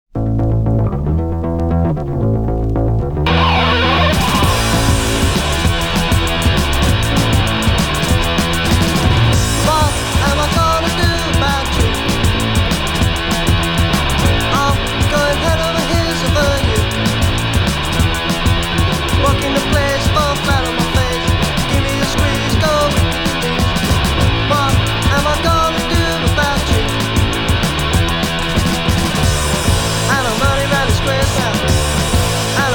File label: groove